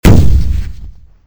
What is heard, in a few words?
gun